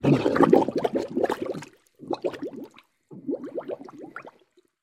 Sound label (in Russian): vypuskaet vozduh i tonet